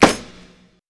Snare

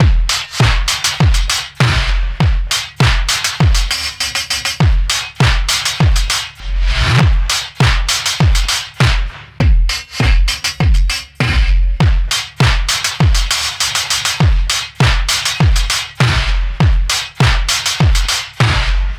Resampled Breaks

